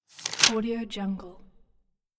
paper flip

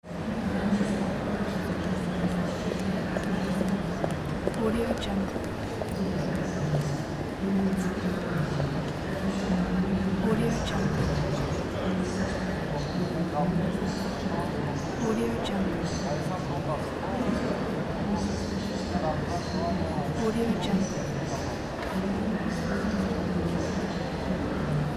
airport ambience

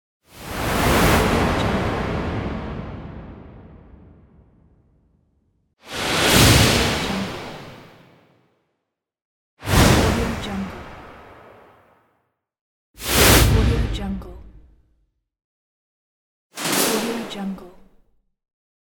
whoosh

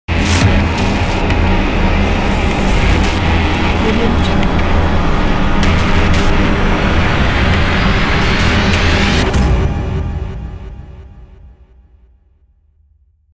horror ambience logo; kipaloops

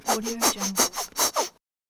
empty shampoo bottle squeeze

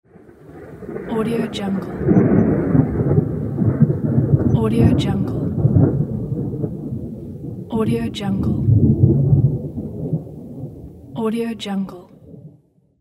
thunder

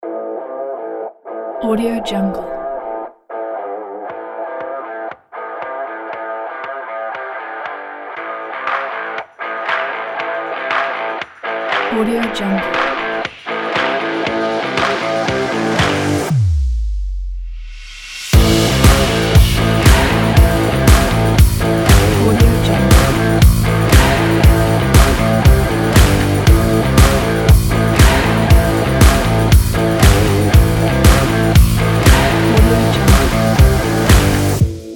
workout music kit

